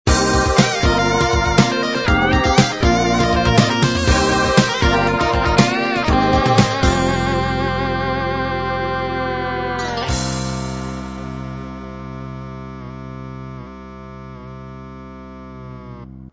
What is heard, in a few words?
fatback groove end